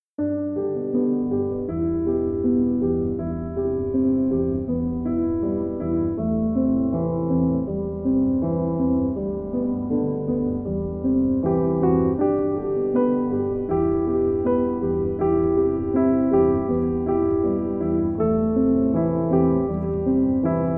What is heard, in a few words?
Piano Relaxation Piano Pianissimo